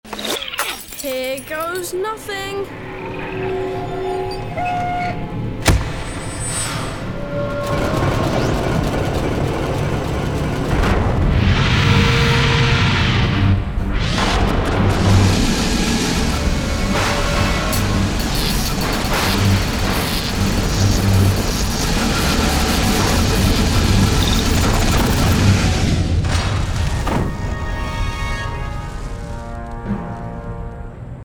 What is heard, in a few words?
Opening Trap Door